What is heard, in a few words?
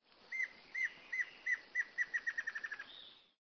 Dysithamnus mentalis Choquinha lisa